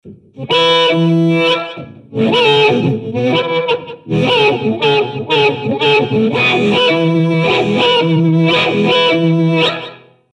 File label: wah on